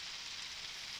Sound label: Background Noise